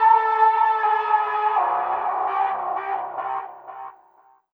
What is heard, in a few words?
Walrus, Leads And Riffs; Sonic Foundry (Sony Creative Software); Synchro Funk